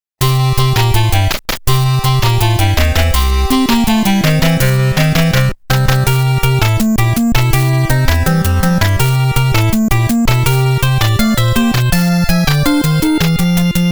地下BGM; Sweat On The Beat; バイオミラクル ぼくってウパ; Konami Antiques Family Computer

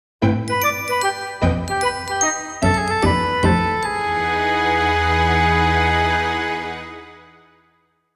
ジングル; Apple Tea de Ochakai

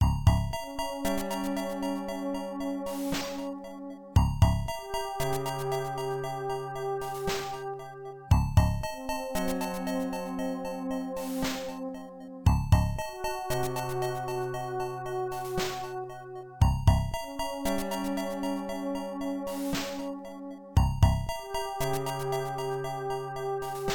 囚われて (FM音源版)

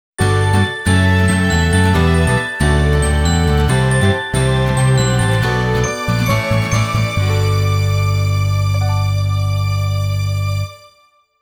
ジングル; Fuyu Kiss; Crystal Snow; Voice Drama CD; Anata to Mukaeru Asa

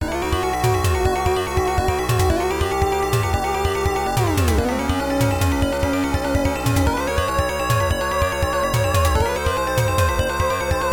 危機 (FM音源版); Illusion City; PC-9801

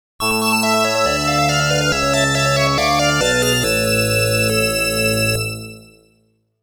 ファンファーレ; Sorcerian Complete Rare Selection; 宇宙からの訪問者

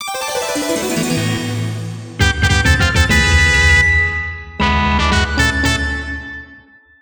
ドアクローズ～ファンファーレ; Hudson Music Selection; Milon's Secret Castle

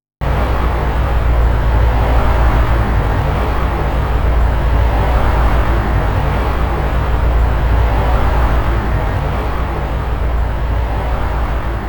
雑踏; SNK Arcade Sound Digital Collection Vol. 21

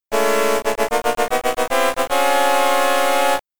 予選ゴール・ミュージック; ポールポジション; Pole Position

